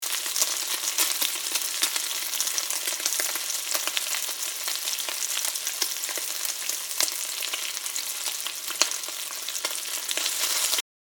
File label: Frying pan bacon frying crackling sizzling